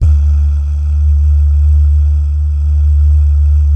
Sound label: BS BAH, Vocal Planet Jazz & FX; ILIO; BAHS GUYS